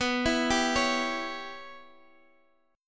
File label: G 6th Flat 5th B